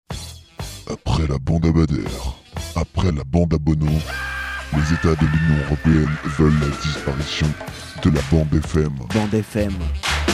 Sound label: Jingle Radio; Clash